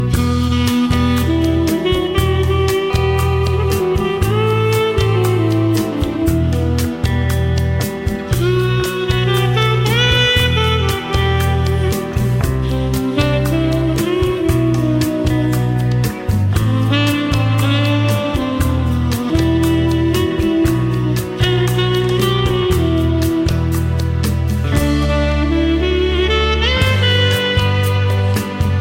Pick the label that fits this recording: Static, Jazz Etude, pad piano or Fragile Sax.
Fragile Sax